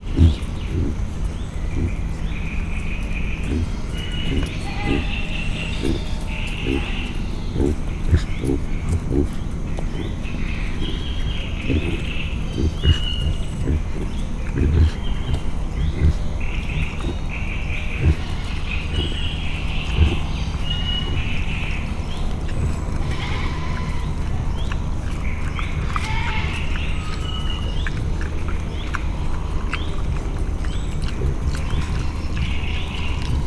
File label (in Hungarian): esznek, ormányosmedve; szegedi zoo 2011